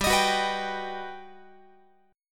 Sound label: G Augmented Major 7th, Guitar; strummed